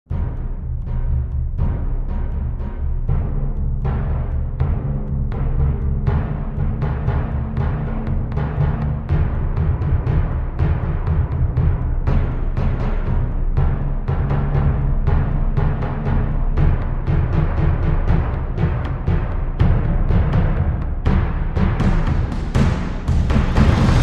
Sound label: Drums Only Version